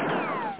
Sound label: RICOCHET